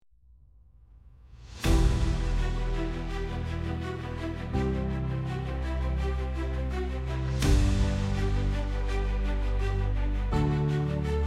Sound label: epic